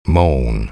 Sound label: moan